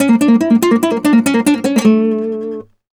Flamenco, Guitar, a

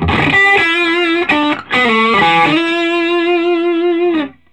SOLO E, Vintage Blues Guitar

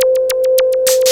HIT, HIPHOP; Rubber Chicken Software Sample Bank

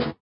SWUSH; Spectrasonics; Metamorphosis

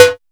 percussion, drum machines, FutureRetro FR777

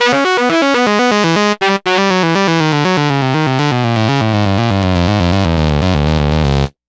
Sax Line; Dr. Fink's Funk Factory

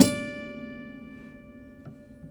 Prepared Piano; Battery 3 Sample Kits

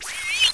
Scratch; Urban Shakedown

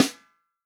REAL DRUMS FUNK KIT

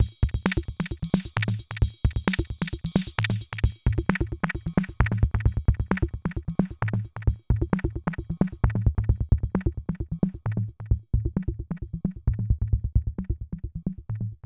Processed Perc

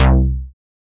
SHORT BASS, SYNTH FUNK; Zero-G; Funky Elements

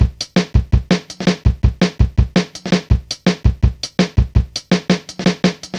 Drum Loops, Jungle

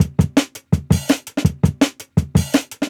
Jungle Breaks

